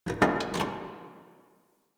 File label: Parking Lot Car Door; Warframe; Ambience; Nineteen Ninety Nine